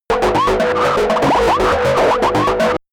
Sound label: calculator low, roboter; comedy-cartoon; soundpool